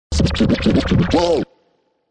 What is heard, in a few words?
DJ Scratch, Pro DJ scratch; DJ (Sound Effects:Musical)